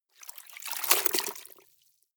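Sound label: clothes water up down medium, household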